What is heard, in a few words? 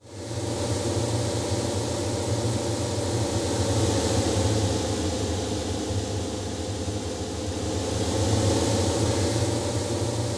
Boss DroneWave Attack; Going Under; Avie